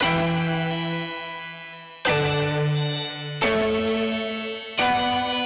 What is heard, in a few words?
Taped 88BPM B min, Pianos; 99Sounds; Monosounds; Music Loops; Instrument Loops